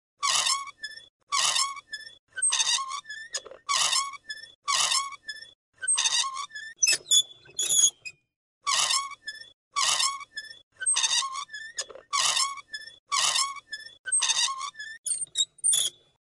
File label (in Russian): Vodokachka